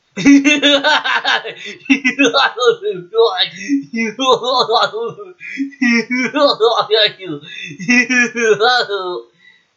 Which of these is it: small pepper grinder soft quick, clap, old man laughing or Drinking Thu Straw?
old man laughing